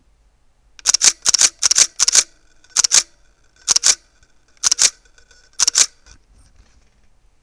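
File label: Pentax K100D continuous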